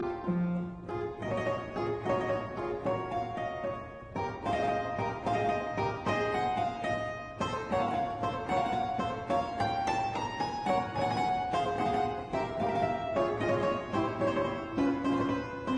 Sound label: Keyboard Sonata in C minor; Seixas; No 15